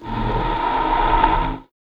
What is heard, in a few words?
extra noise